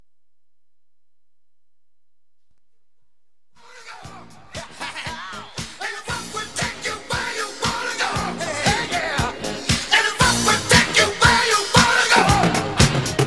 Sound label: funk is on lp break